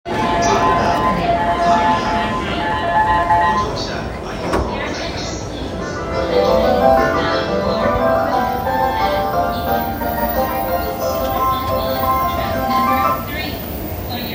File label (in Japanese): 京浜東北線神田北, train sounds